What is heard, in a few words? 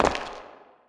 land; KINGPIN; player; step marble